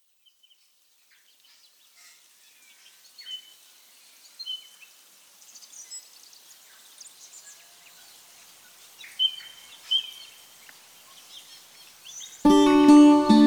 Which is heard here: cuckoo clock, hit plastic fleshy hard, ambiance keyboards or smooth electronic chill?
ambiance keyboards